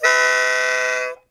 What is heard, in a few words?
Train Whistle, cartoon Foley Fx; musicradar; cartoon caper